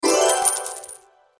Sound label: purchase; cstrike; clarion